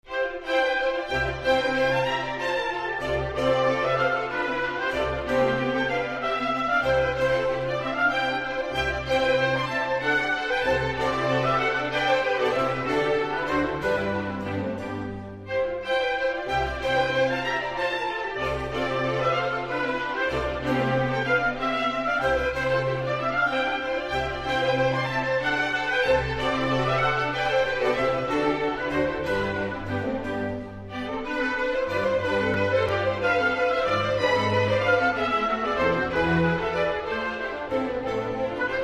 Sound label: Orchestral suite in C major Forlane; BWV1066